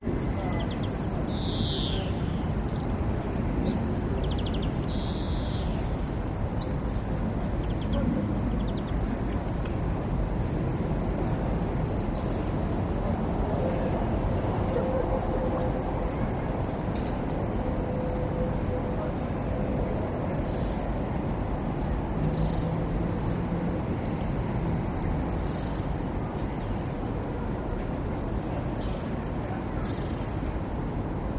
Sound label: prague park day birds light crowd small fountain birds walking arou; vojanovy sady; traffic